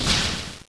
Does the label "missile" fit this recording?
Yes